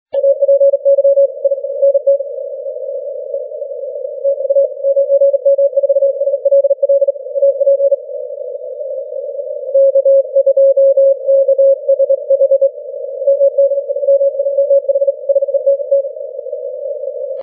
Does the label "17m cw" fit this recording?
Yes